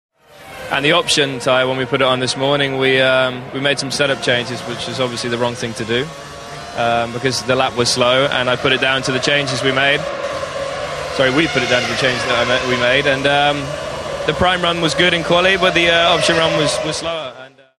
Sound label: button